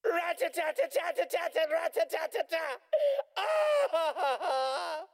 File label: Puppy Bark